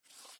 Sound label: slide